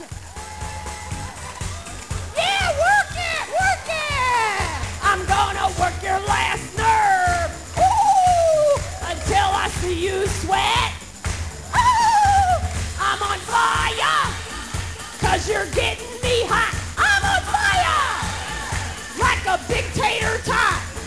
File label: wed polka; valentines